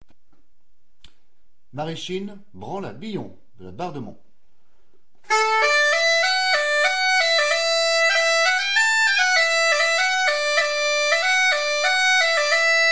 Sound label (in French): Maraichine Branle à Billon